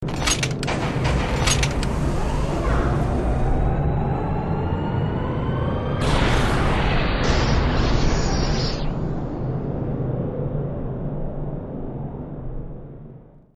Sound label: panorama fx